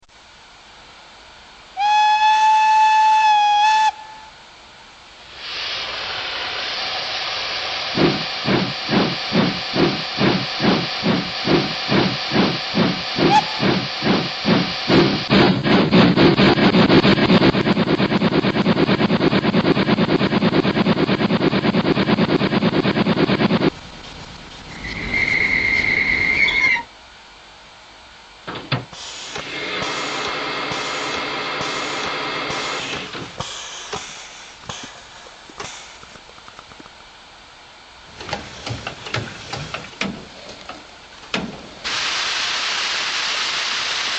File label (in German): Dampflok Sound; Brawa; Loksound H0; G4-5